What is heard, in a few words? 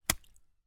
foley foot stamp in small puddle